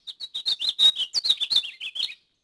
houses bird; Tony Hawk's Project 8; XBOX

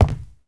step wood; people; Pirates Of The Caribbean

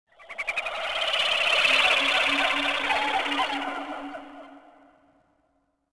creature rockcanyon; yavin

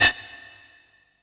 DIALOG MOVE; Pump It Up Exceed

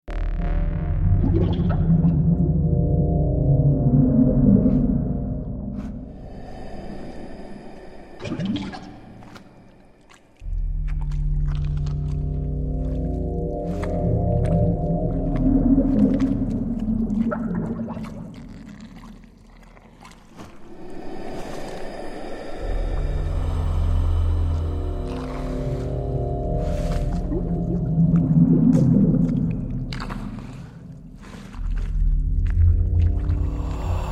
orphanage swamp, music; Painkiller Hell Wars

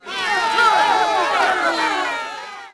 CROWD NEG MED; Cars; XBOX

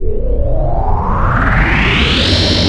deflector activate; Star Wars Clone Wars; XBOX; Xbox Live Effects

